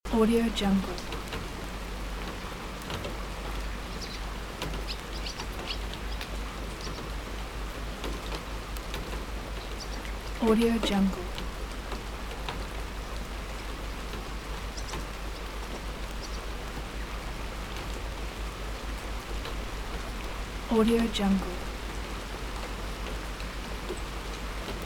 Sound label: rain falling with swallows sitting on wires loop; fxprosound